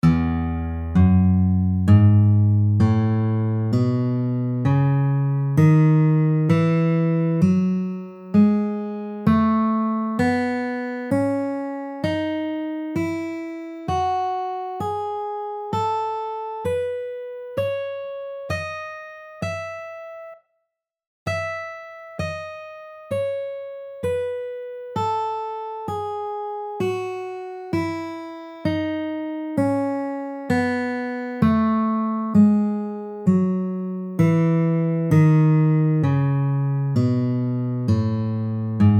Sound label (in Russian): гамма ми мажор